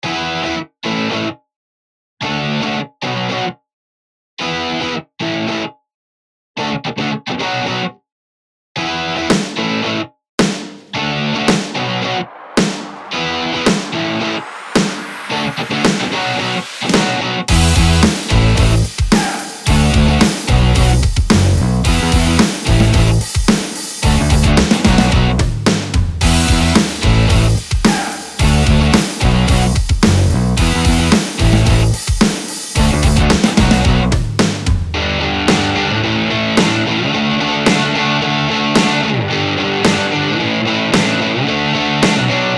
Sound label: Guitar